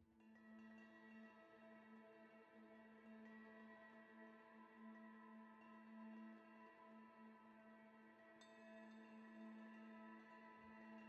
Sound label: tonal Amb Bell Spiritual A